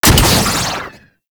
Heat Gun Shot; Warframe; Grineer

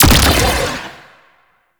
Primary Shot, Weapons; Warframe; Cephalon

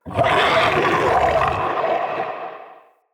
Corrupted Slug Sub Boss Vocal Attack; Creatures

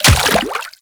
Step Water, Footsteps

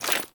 Generic Footstep; Warframe; Dagath

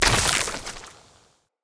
Claw Proj Impact; Enemy; Corrupted Sproutfolk; Combat

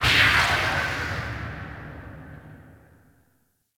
Gun Battery Beam Void Burst Open, Ship Props; Corpus Ship

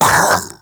Vocal Pain, Infested; Splitter Split; Grey Strain

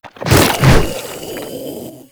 Strike Flesh; Warframe; Proto Infested; Ancient; Abilities

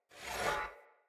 Great Sword Whoosh Accent; Weapons; Player; Mendicant Great Sword

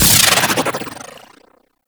Redline Projectile Launch; Warframe; Runner

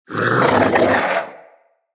Vocal Alerted, Hunting Critters; Characters; Predasite

